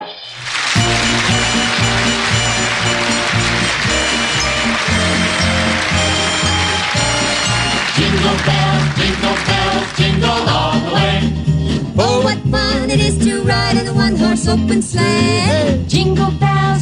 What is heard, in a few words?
Jingle Bells (Live)